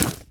foley sports bag grab pickup catch; Gamemaster Audio; Footstep and Foley Sounds

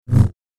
Double Bladed Saber Sound; FXHome

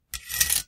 sword Slide; Foley; Kenney's Sound Pack

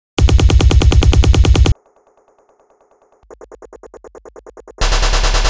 Loop, 140 bpm, Stutter Sounds; 99Sounds; Mangling Audio

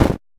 footstep snow; Kenney's Sound Pack; Impact Sounds

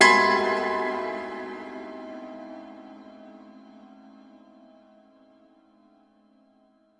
Sinister Chord, Cinematic Impacts; 99Sounds; Rumore Cinematic Impacts

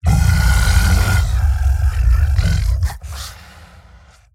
Monster Roar Growl; SoundBits; Screams & Shouts 2 - Monsters

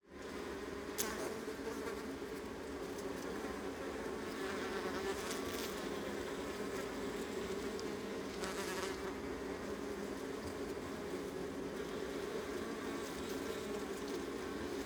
Honey bee colony close; Northern Mediterranean; Adriatic sea; Ivo Vicic

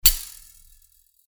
Sword Sound; FXHome; Fighting Sounds

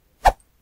woosh; Kenney's Sound Pack

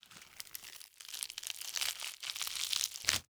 Skin Peel; Flesh, Bones & Gore

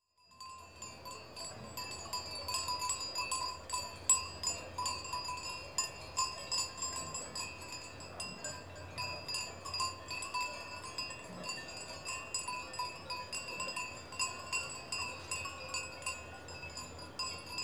Switzerland, Small Cowbells; European Soundscapes; BBC Sound Effects Library

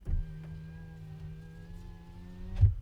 Toyota CHR Turbo Window Close Interior; DPA4021; Toyota C-HR Turbo 2017; Pole Position

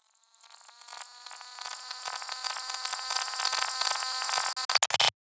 Textures; 99Sounds; The Weird Side Samples

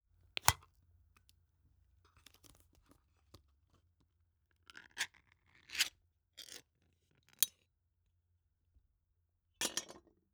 Opening Tin Lid; The Burger Kitchen

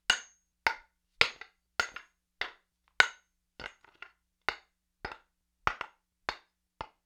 Mace Spear Haft; StillNorthMedia

